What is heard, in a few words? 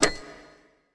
checkbox; World of Tanks Blitz